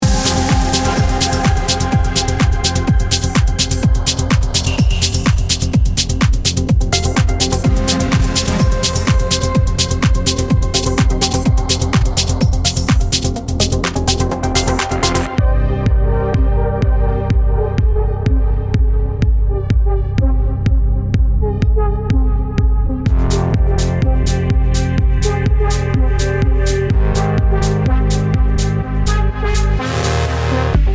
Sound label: techno